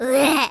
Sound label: section fail